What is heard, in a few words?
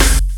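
JUNGLE SNARE; Distored Reality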